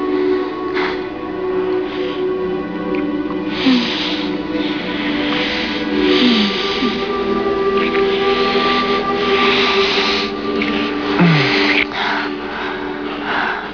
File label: kiss